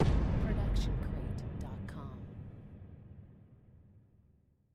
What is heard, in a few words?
Epic Hit; soundscrate